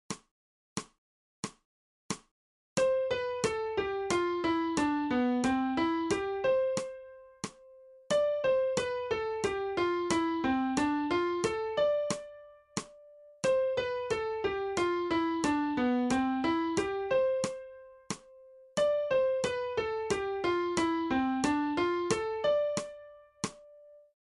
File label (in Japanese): 新フレットレス